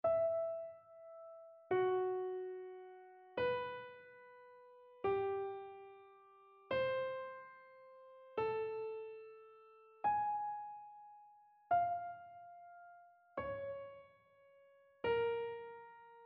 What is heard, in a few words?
row dictation; ear training